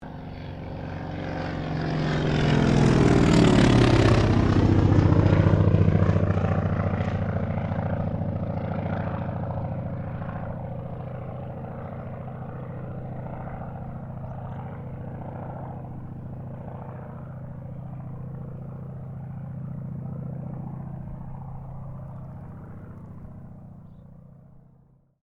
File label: AIRCRAFT CAC WIRRAWAY PASS; CA-16 Wirraway; Airplanes; Vehicles